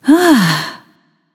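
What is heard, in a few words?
nonverbal sigh; smite; voicepack; izanami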